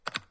click

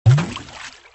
splat

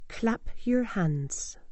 clap

